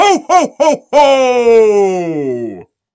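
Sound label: Father Frost laughs deep ho ho ho; deti; moroz smetsya basovityiy ho ho ho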